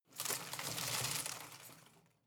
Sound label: home plant leaves rustle rattle short